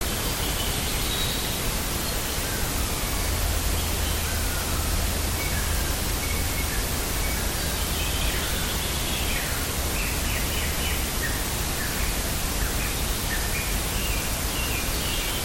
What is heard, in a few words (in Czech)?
chrastal vodni